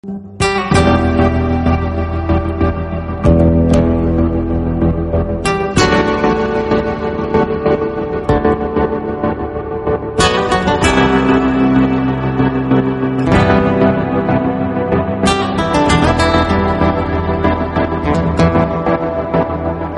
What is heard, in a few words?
fusion background music